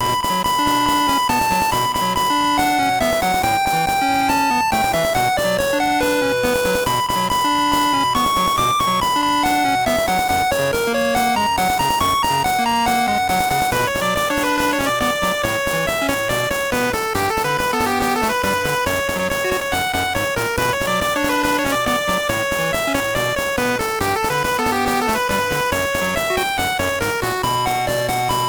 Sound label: Timeline Broken 8bit